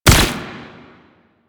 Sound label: Archgun Projectile Explode; Warframe; Archwing